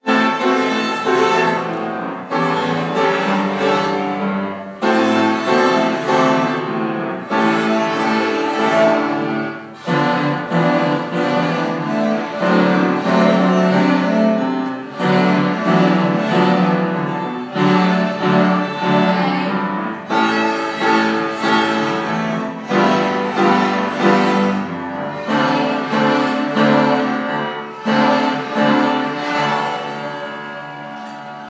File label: strings